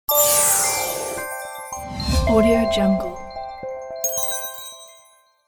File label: bell elegant logo; kipaloops